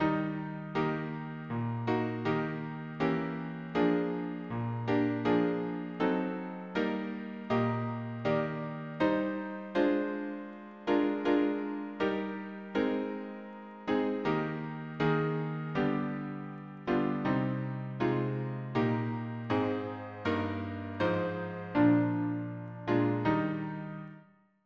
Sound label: harmony piano